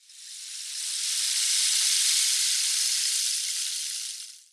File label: Hour Glass